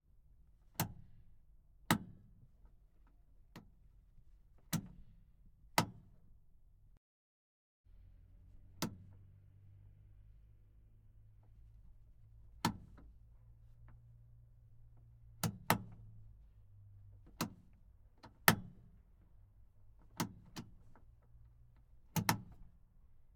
zil131 var light button; mkh8060

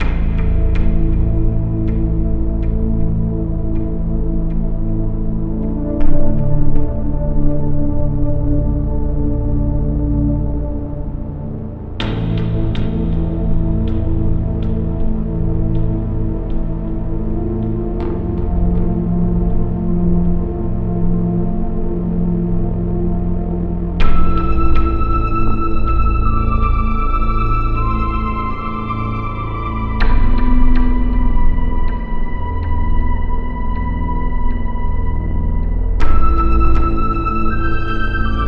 at night modern nights loop, music

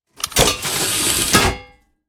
Load, weapon; lancer weapon fx

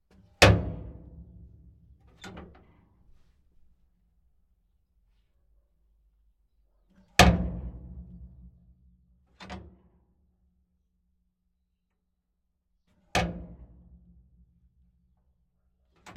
SU-76 clutch; mkh8060; Sonniss GDC

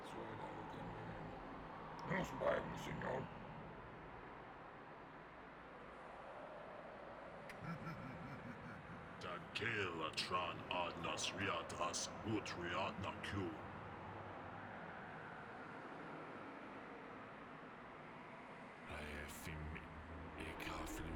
desert refuge with orcs loop, ambiences; Michael Ghelfi

